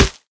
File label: foliage, bullet impacts; physics; Half Life Model Pack